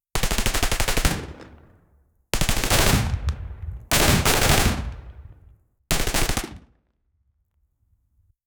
realistic single shot glo, pistol

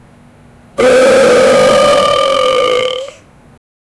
barfing loudly; spongebob

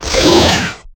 dragon attack take down

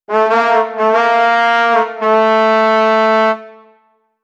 three trombone warning, brass

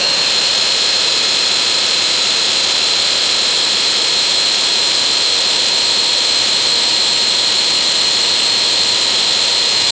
the French, steam engine

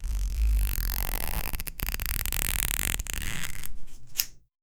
twisting, mechanism

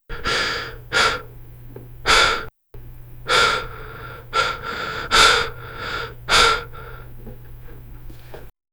create a sound of someone, breathing

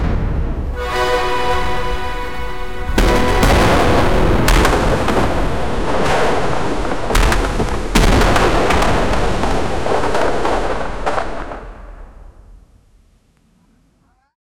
an intense and chaotic, battlefield

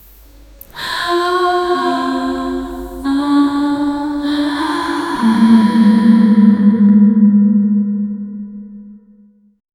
chillingly dark and haunt; world

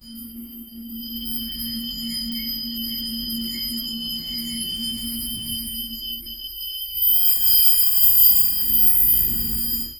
the sound of a crystal

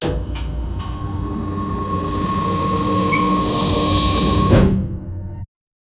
low pitched mechanical sh, elevator

